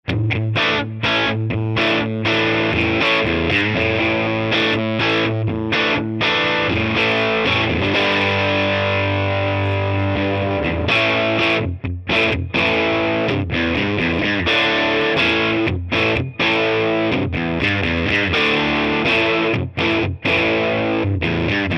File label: Strat rock